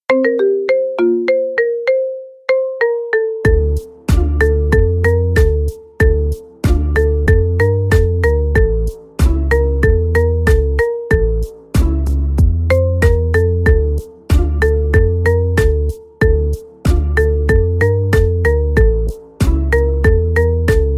marimba remix; Рингтоны Без Слов

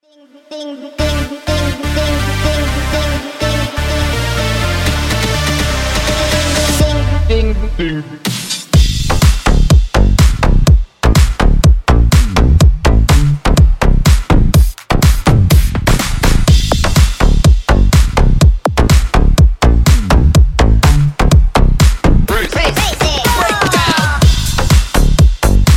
mashup

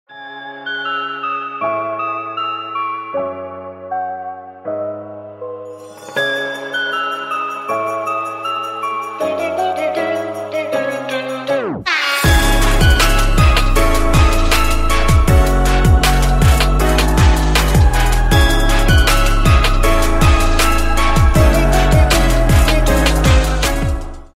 trap remix; Новогодние Рингтоны

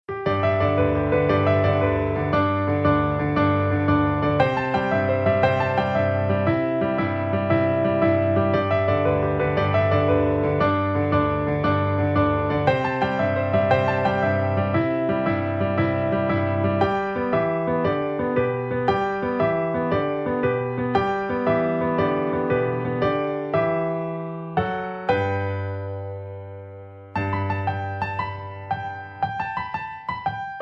piano cover

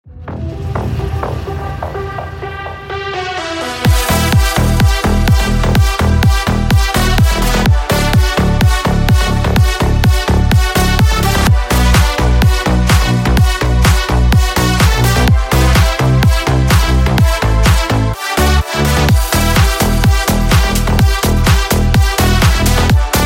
remix; Рингтоны Без Слов

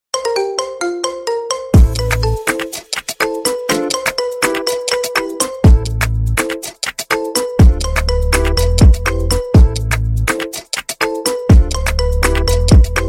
marimba remix; Рингтоны Без Слов

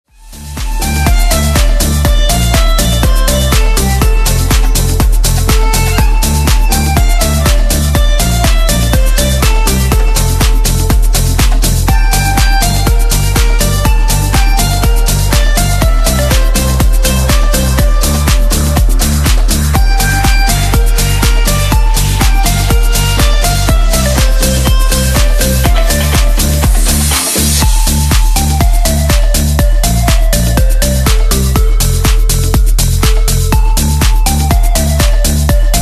shuffle dance remix

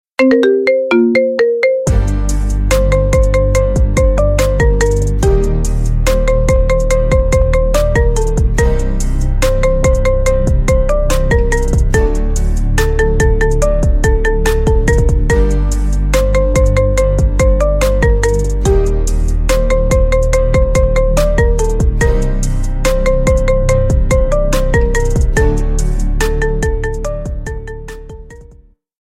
marimba remix; Рингтоны Без Слов

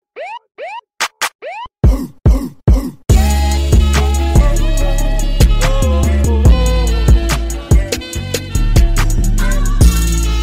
trap remix

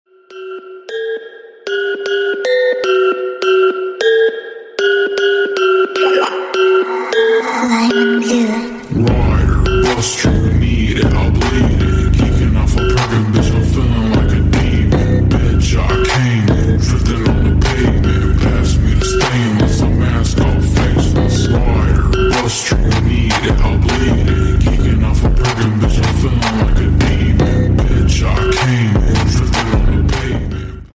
slowed reverb; Рингтоны

